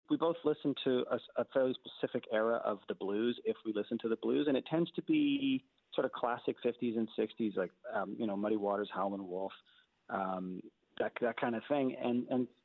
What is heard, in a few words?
picking covers; Whitehorse; North Bay